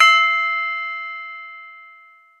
gong

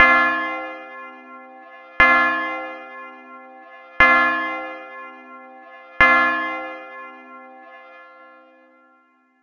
bell

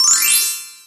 glocken

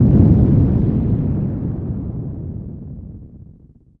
thunder

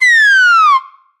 fall

